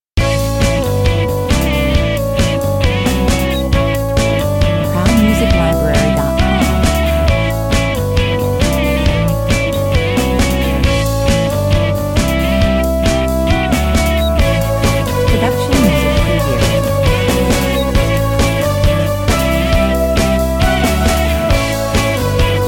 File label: quiz 77 bpm